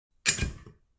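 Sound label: bar shaker sounds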